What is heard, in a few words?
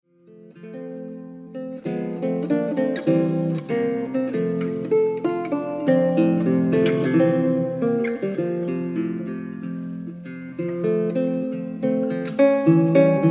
Romance; 1796 G. B. Fabricatore; de Lhoyer